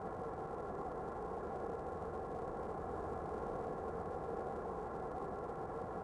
ambience chimney; Star Wars Obi Wan; XBOX

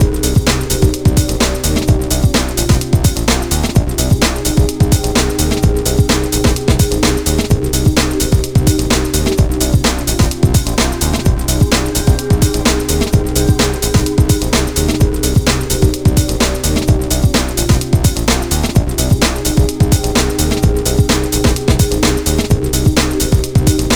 spotted, music; Triggerman; XBOX

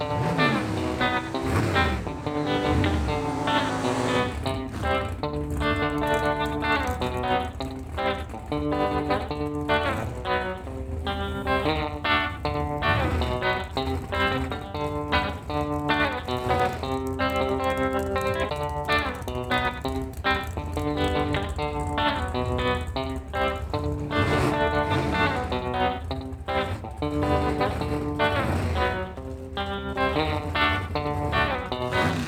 music raceflyover; TyTheTasmanianTiger3; XBOX

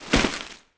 Alien Bird Flap; Aliens; Mace Griffin Bounty Hunter

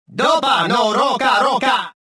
escaping

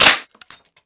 glass bat break

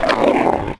Acro growl; Dino; Jurassic Park Operation Genesis; XBOX

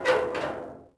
cauldron Swing; objects; Star Wars Obi-Wan; XBOX

